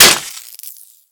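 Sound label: dest computer monitor; Call of Duty Modern Warfare; physics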